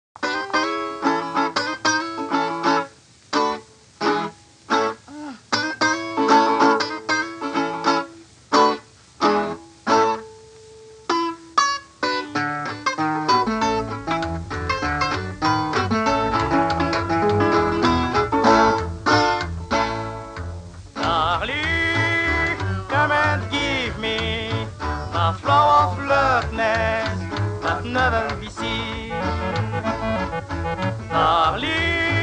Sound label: African Jazz